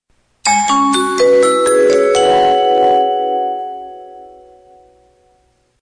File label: xylophone